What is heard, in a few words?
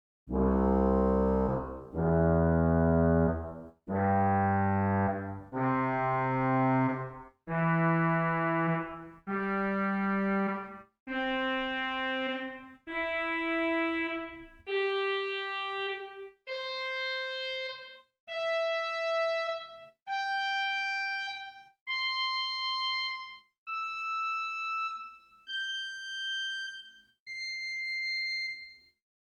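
Swell Trompete 8 arpeggio, organ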